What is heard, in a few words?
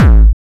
hard bass drumz